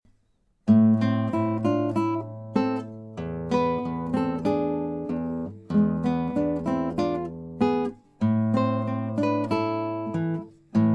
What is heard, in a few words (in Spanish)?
Tango de Salon duo; Gitarrenmusik